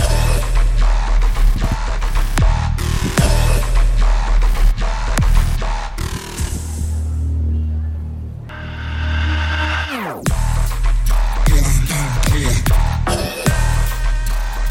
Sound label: Villain BGM; Kodiyil Oruvan